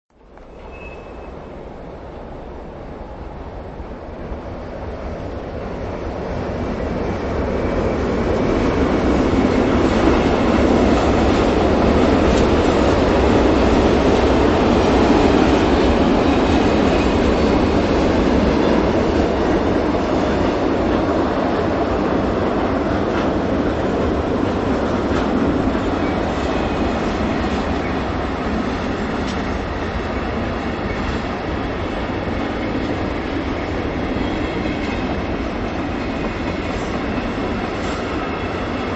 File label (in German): gueterzug